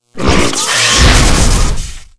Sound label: mech ape lord attack, monster